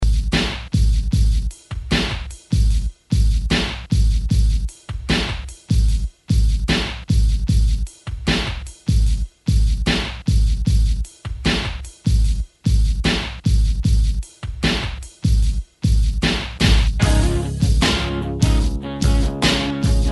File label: Rock ReDrum 75 Bpm; DJDOLLS